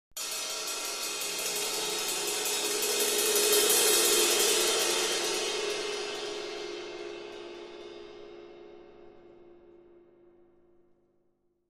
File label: Cymbal Two Crescendo